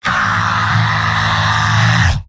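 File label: pain critical death, male; heavy; tf2; garrysmod; mvm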